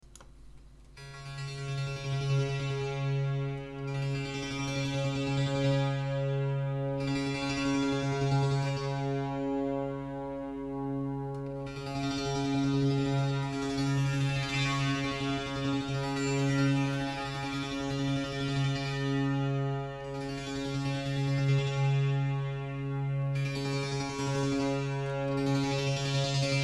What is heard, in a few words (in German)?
soundbeispiel massage polychord